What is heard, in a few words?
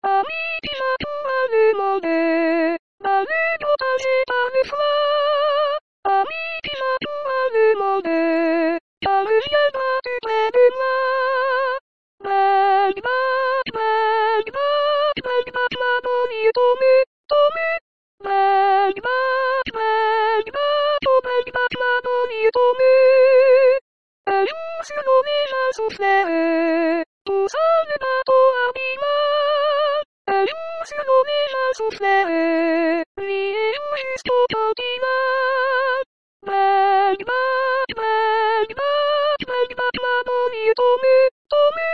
Soprano